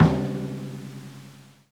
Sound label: Tom